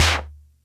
Music Percussion Computer Tom, Drum Machines

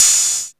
ZIP OPEN, hihat

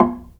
Log Drums, DRY; Roland LCDP11 Africa VOL-1; Wood & Metal

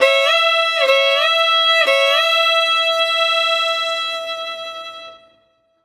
Arabic Violin; Sonic Foundry (Sony Creative Software); Continental Drift Aftershocks

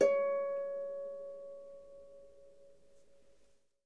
Harp C#5; Miroslav Vitous - Percussion & Harp

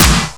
e snare, Emu Sp12; Drums

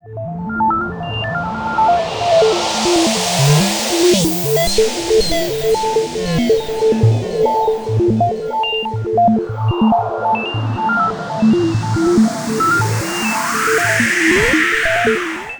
Arp Synth, Trip-Hop; Sonic Foundry (Sony Creative Software); Rondo Brothers Trip-Hop Loops & Samples

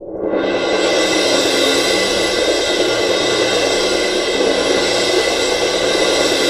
CYM, Percussion; ILIO; Synclavier Percussion 2; World & Orchestral

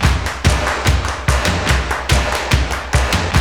JAPAN DRUMS; Zero G - Ethnic

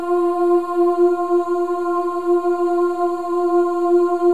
OO F4, WOMEN; Spectrasonics

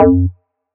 Synth Sounds; neuro; Stanza

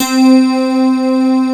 STACK

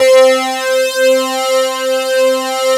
SYN WS SEQ, SYN DIGITAL; Sound & Vision - Gigapack I CD 2

